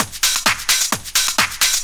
drum 130, techno trance drumloops

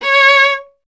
STR VLA, Viola; Solo Instruments; Viola 2; Miroslav Vitous

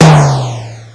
PRC F, SPECIAL FX; ProSamples vol.55 - Retro Sampler

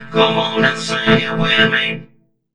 Funky Vocals; USB Soundscan vol.18; AKAI

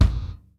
GATE, KICK DRUM; Sampleheads; New York City Drumworks VOL-1

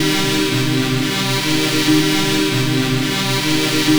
Pad, Trance

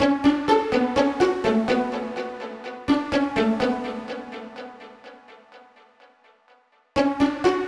Pizz, 125bpm Bm; Zero-G; Nu Disco; Construction Kits; Fashion Week

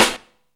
cut off, snares; Zero-G - Funky Elements

